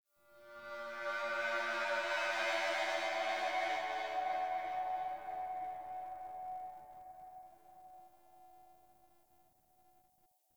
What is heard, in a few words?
ambient sentient moan; Warframe; Tau